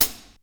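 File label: GATE closed hi-hat; Crypton; DrumBase5000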